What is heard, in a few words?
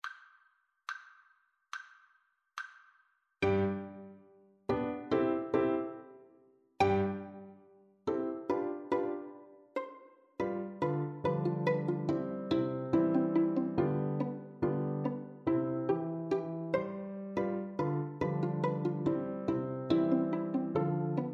pizzicato polka, violin